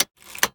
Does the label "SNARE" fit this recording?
No